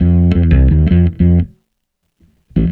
Bass

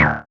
SYN SYN, dance; Sound & Vision - Gigapack II CD 1

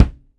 V20 Kick; Digital Sound Factory

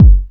KICK, Big Beat; Big Beat Samples & More; AKAI

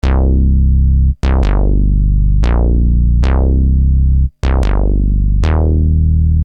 Syn-Bass m Cm, Techno Synth Loops, 150bpm; Sonic Foundry (Sony Creative Software); Signals I

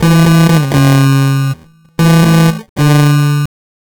Guitar Loop, 120BPM

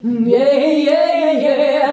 "HNYEAHI", FEMALES; Best Service; Hyper Dance

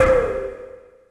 IND; Zero G; Man Machine

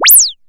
UP ZAP; Back In Time Records; Big Bang

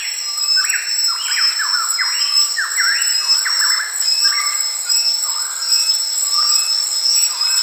ATM SWAMP, Swamp Atmosp; Roland - LA Composer vol. 2

